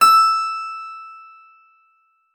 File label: SPIN D#6, SPINET; Xsample VOL-7